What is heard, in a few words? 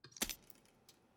error; lockpick